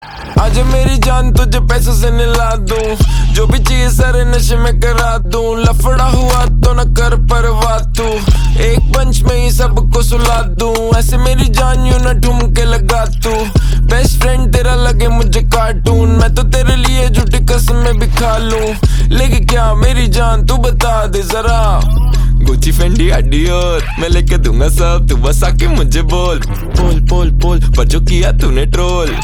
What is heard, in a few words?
Ringtone